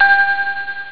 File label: storm bell; ST-XX Amiga Soundtracker Sample Packs